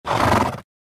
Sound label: Horse snort